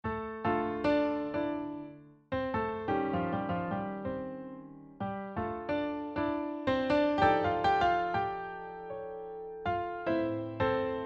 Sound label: accompaniment